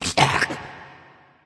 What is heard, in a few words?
grunt; Smite; bakasura